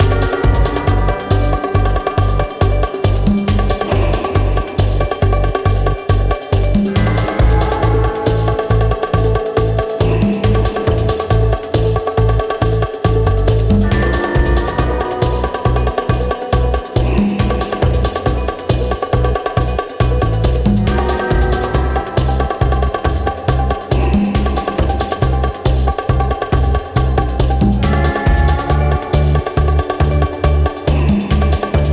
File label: Bonus Re Spin Music Loop; Tiki Mania